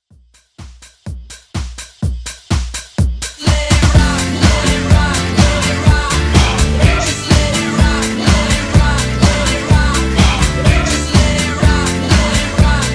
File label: 90s Party Redrum